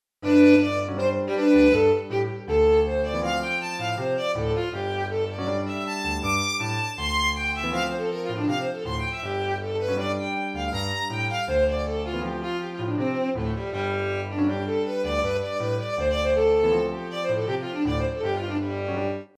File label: violin Impr; NRK